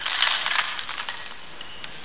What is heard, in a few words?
chain